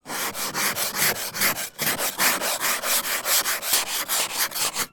transportation ice scrape from car window external